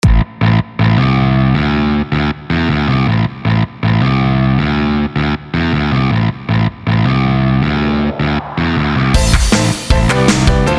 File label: Cheerful upbeat positive happy rock background music; Mantra Studio